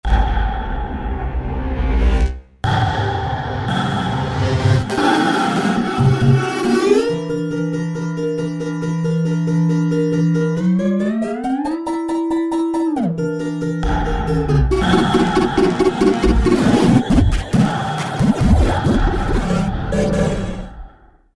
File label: Fireworks, Roland V-Synth; Factory Patches